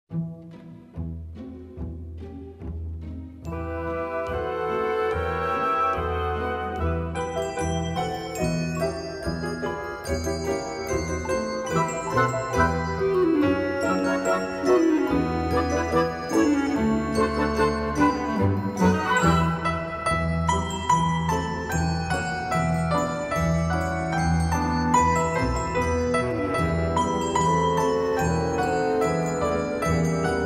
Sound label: holiday trad